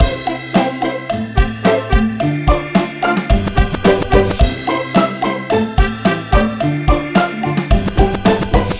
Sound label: Dance; Talking Pato; Android iOS